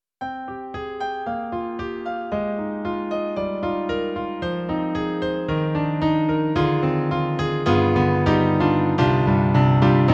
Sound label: Concert Grand; Roland; SonicCell